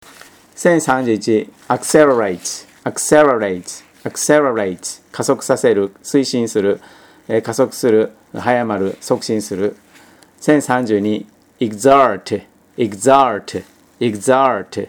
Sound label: tango